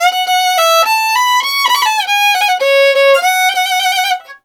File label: VIOLIN, Balkanish Traditions